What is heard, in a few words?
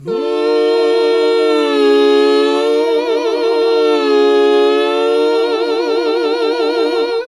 HAL GOSP., GOSP. MMMhh; Sound & Vision - Gigapack II CD 1 (Roland)